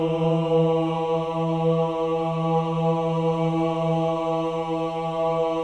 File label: choir ohs E3; Spectrasonics; Symphony of Voices